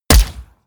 gun shot silencer designed ricochet

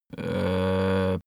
sundries rattle soft; berufe; handwerker; soundpool

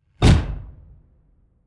sci-fi projectile penetrate metal; schusswaffen

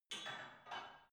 barbell only replace reverberant soft

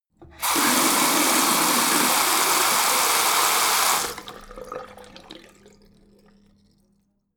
tap flow ultra hard short, badezimmer

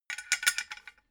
metal scaffold pole splint; industrie-wirtschaft

